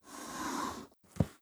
start slide

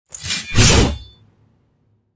warrior close attack

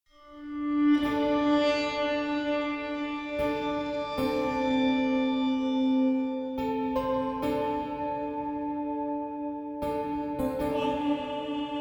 Exploration; The Witcher 3 Wild Hunt; Skellige

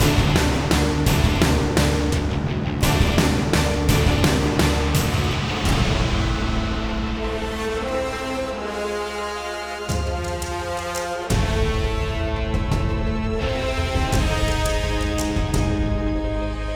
Battle Loop; bakugan champions of vestroia 2020; switch gamerip

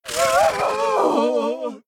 Stagger; Devour; The Inn; Zara

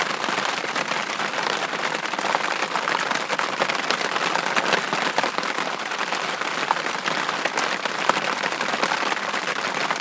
environment rain outside tent

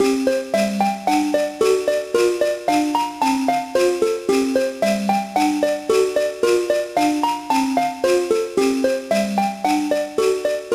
Bad Rating; santa claus junior gba gamerip 2002

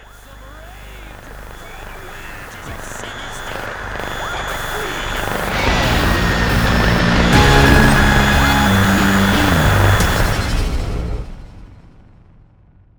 Static; The Binding of Isaac Repentance; Dogma Static Cut Scene

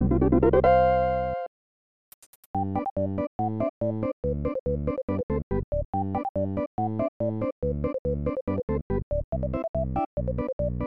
Stage Clear; Super Scope 6; Blastris

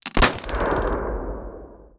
Tactics Grenade

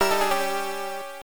Surprise; Ninja Ryuuken Den; turbografx-16

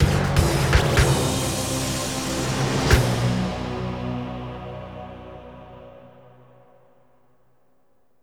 Game Over (Surround); Street Fighter IV; X360

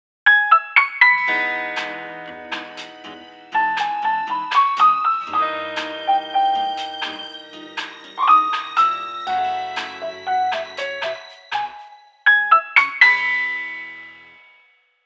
Radio Jingle (Evening); Animal Crossing New Horizons

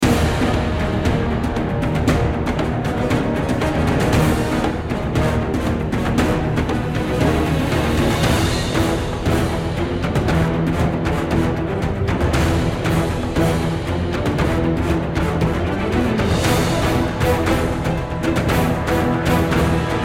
BGM mid boss wave; dragon heroes shooter rpg; android game music

